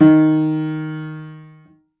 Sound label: Piano, Harmonic